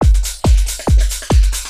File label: trance